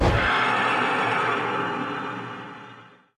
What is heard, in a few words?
ambient port; World Of Warships; port3d